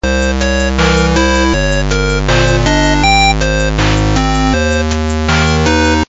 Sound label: bgm